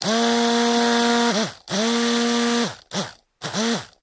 Motor, Small; Vibrating With Buzz, Start Run Spurts Stop